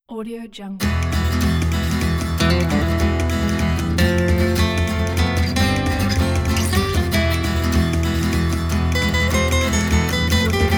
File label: flamenco